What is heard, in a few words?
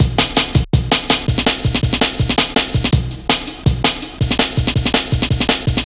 164 Insects, breaks; m8 breaks